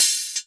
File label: Hihat